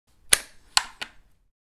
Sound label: Sniper rifle foley putting safety on